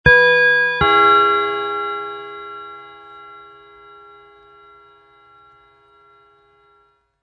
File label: bim bam